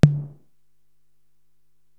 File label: Tom, Drum Machines